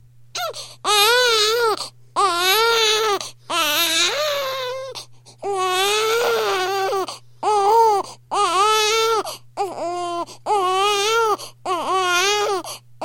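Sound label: newborn baby crying from hunger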